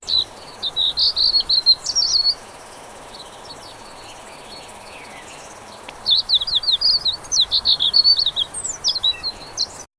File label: sunny summer afternoon in